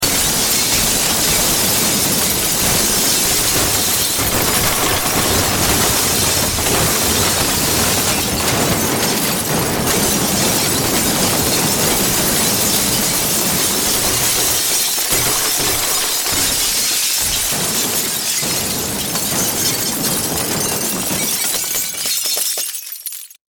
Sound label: Impact Crash Wood Glass Long; Materials